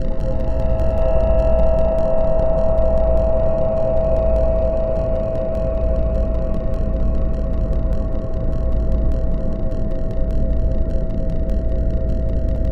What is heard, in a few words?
undertones, Rumbles